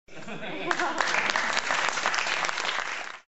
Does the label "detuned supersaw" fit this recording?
No